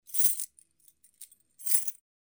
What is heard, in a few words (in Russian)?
svjazka kljuchej